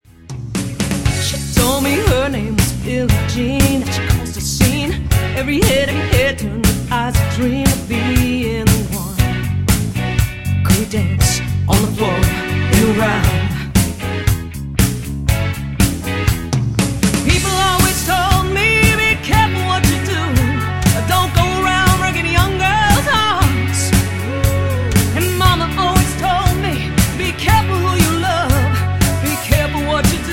Cover Band; Seattle

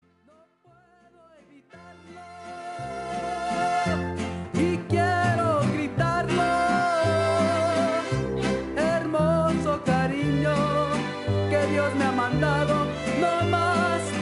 Mariachi; Austin

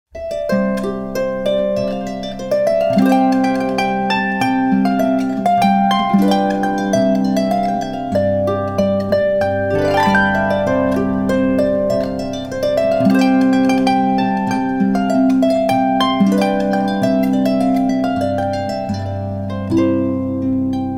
Harpist; Orlando

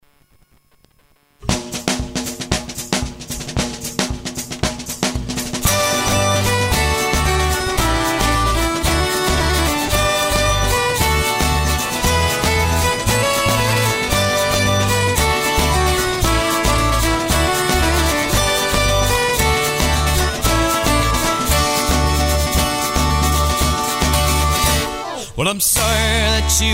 Irish Band; Washington DC

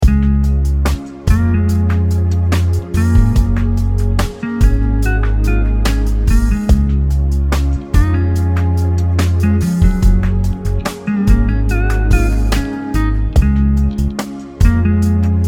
Soul Singer; Frankfurt Soul Singer

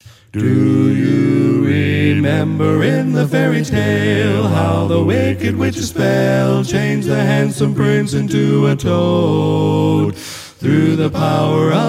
Barbershop Quartet; Atlanta

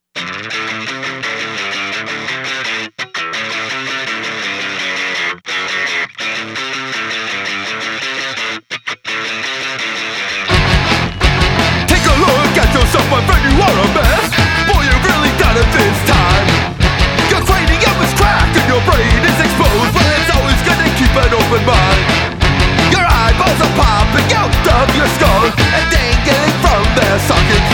Rock Band

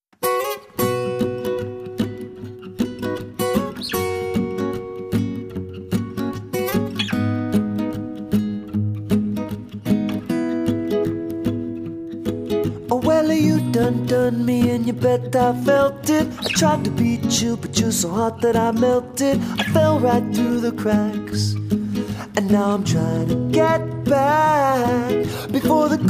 Dance Band; Sacramento

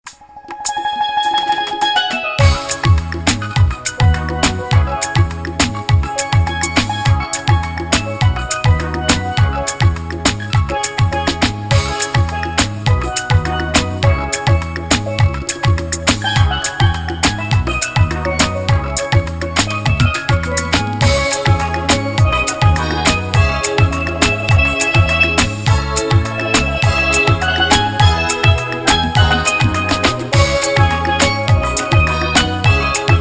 Caribbean Band

